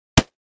Body Punch; punches kicks hits bones

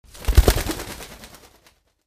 Animal Stinger Bird; stingers & whooshes

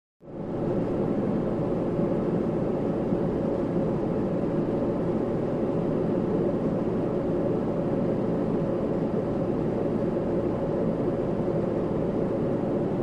Jet Large Int Roar, 727

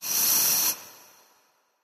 Industrial Air Shrt Bl, factory

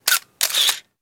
35mm Camera Elect Sh; shutters & movement

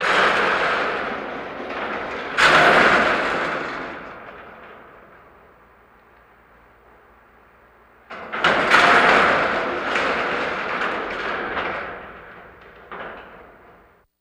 Elevator Const, elevators

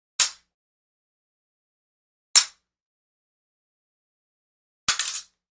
Foil Sword Hit, swords

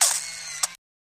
35mm Camera Shoot Au; shutters & movement

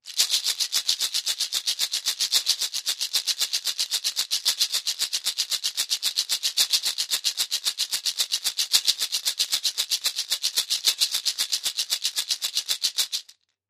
Cabassa Shekere Lati, percussion shaker

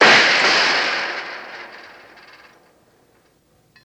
Impact Metal Gate

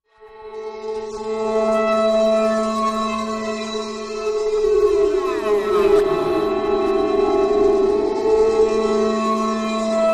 Mind Bender High Robot V, sci-fi ambience

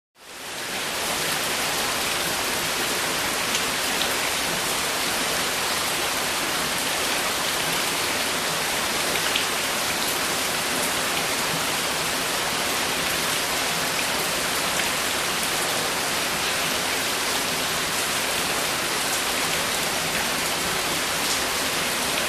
Rushing Water Splash, waterfalls

